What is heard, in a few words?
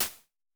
Snare Hats, Vermona Mono15 Drums